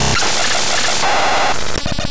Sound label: Rhythm